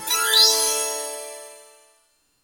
quick cosmic shimmer tiny

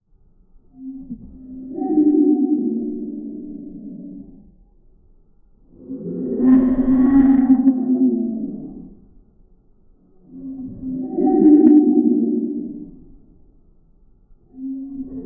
i want deep sea whale, whale calls

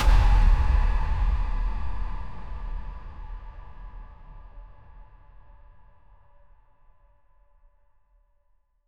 metallic slam short; fast decay

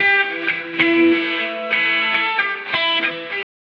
electric guitar; strings

